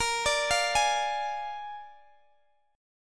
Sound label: Bb 7th Bb Guitar Open D, chord; x,x,20,20,20,18